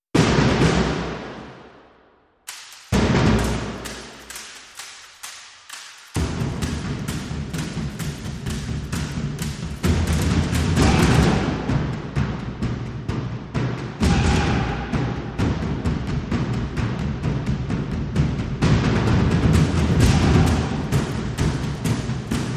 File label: Only Drums 2